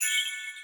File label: field recall bell